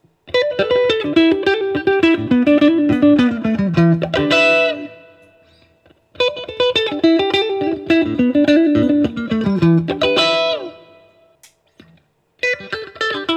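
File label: Guild M80 Tweed Solo, guitar; 1981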